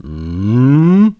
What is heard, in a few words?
Hmmm; Lenzo; Zelda